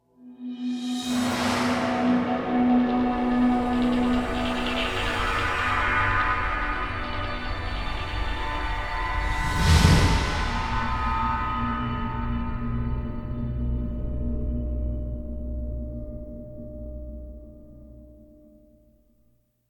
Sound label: Combat Dogfight; Elite Dangerous